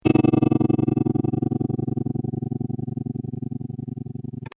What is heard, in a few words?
helicopter